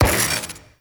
Vial Ability Radiation Shatter; Warframe; Warframes; Alchemist; Elements

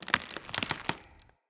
Mockery Collapse; Enemy; Foley; Mockeries; Characters

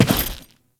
Step Default, Footsteps; Alad V

